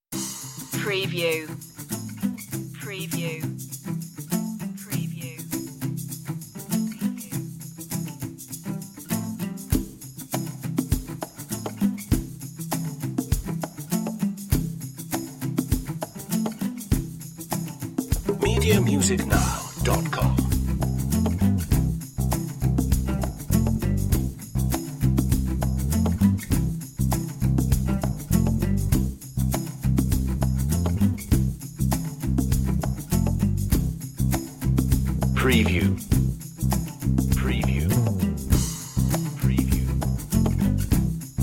underscore less guitars

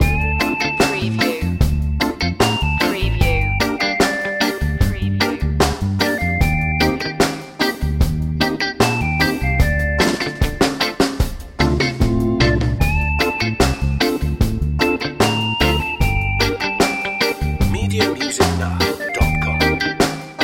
summertime reggae loop

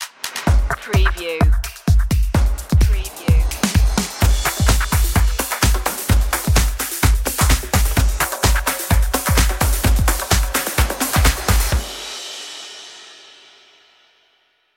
action energy drums

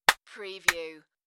Clap Clap; beanstalk audio

